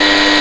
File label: neon; Happy Easter 1.0b1